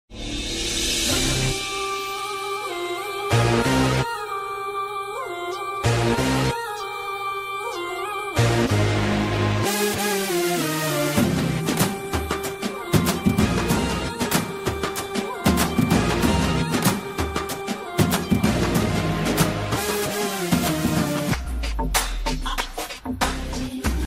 BGM Ringtone, tamil ringtone

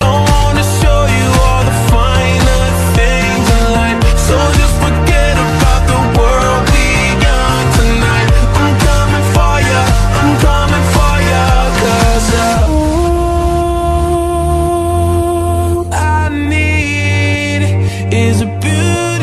english ringtone

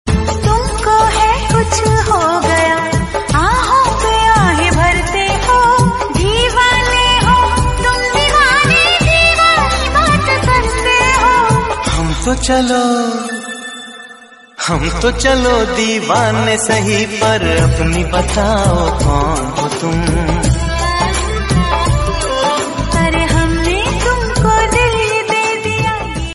hindi ringtone

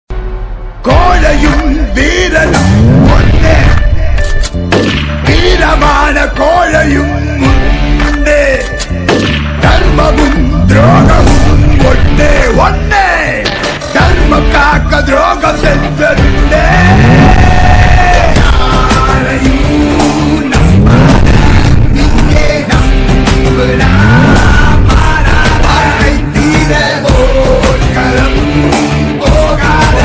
tamil ringtone

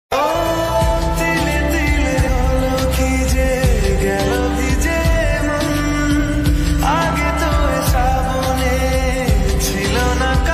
bengali ringtone

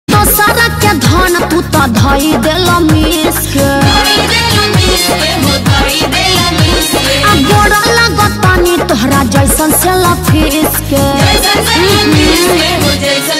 bhojpuri ringtone

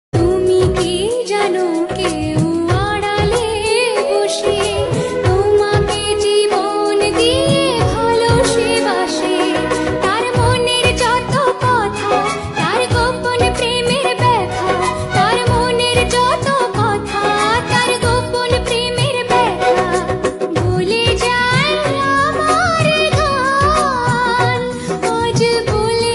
female bengali ringtone

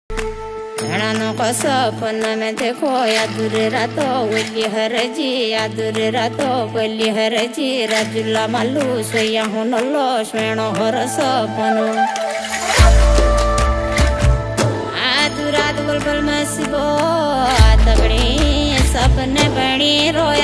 uttrakhandi ringtone

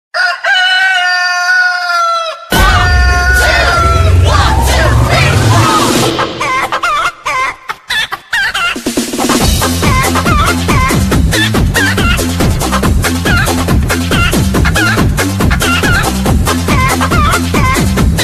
BGM funny ringtone

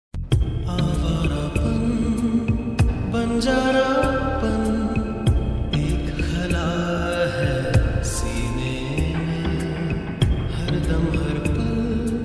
lofi hindi ringtone

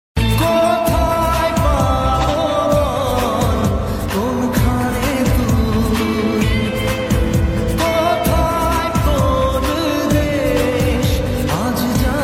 bengali Love ringtone

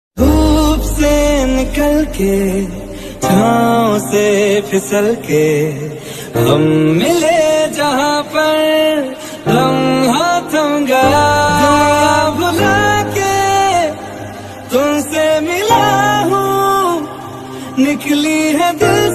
hindi ringtone

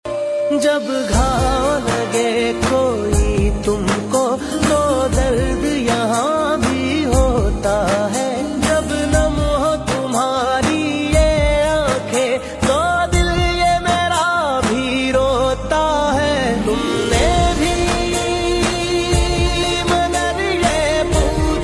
OST pakistani ringtone; Tum kon Piya

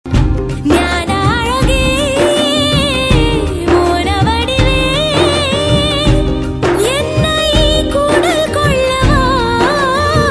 tamil ringtone

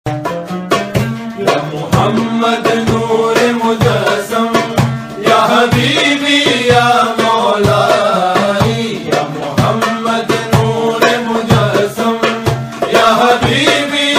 islamic ringtone

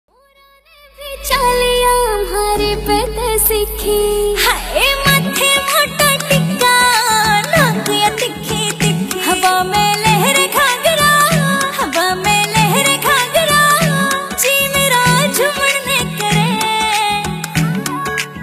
haryanvi ringtone

